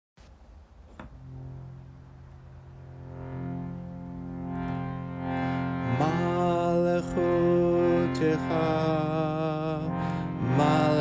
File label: chant; mem